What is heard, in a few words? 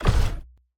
unit Create; Mindustry